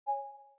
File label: widget icon popup, Samsung Omnia II; Samsung SCH-M710 T Omnia II